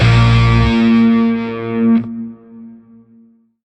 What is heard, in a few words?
Shawzin Blues Chord K; Music; Warframe; Grineer; Chords